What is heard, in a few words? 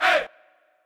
hey chant